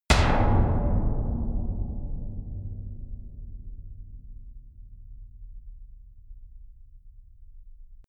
sub metal impact bright

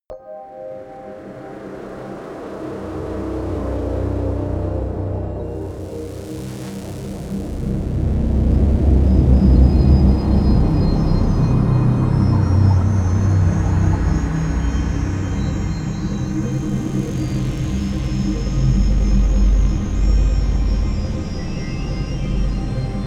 drone mallets versus synths

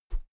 whoosh feather heavy; swooshes-swishes

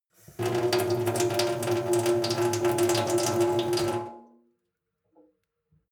sink water flow soft drip; industrie-wirtschaft; gastronomie

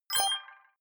notification bleep

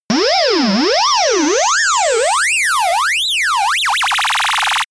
lift shot; bewegung; comedy-cartoon; soundpool

